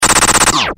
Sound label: noise